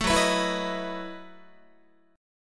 Gb Minor 13th Gb down Guitar; Gbm13; strummed; 12,14,14,14,15,14

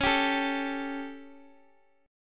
A 7th C sharp

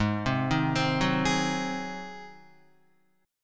Ab 7th Ab Guitar 4F,4A,5C,5G,5C,6E; Ab7 arpeggiated; JGuitar

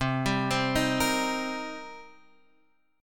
C Minor 7th C Guitar Standard; Cm7 arpeggiated; JGuitar

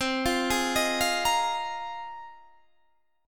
Bb 7th Suspended 2nd Suspended 4th C

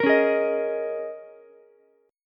A sharp Minor 11th A sharp down Ukulele C6, chord; strummed; JGuitar

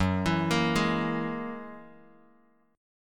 F sharp 9th F sharp Bass Standard; F#9 arpeggiated

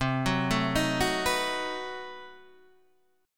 B 7th C; B7/C arpeggiated; JGuitar

